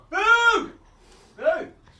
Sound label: boo